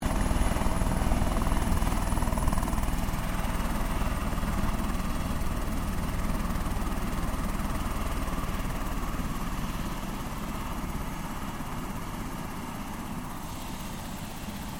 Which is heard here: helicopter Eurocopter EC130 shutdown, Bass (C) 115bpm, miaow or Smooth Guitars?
helicopter Eurocopter EC130 shutdown